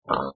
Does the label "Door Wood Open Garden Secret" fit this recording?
No